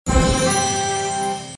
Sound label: Sfx Rank Up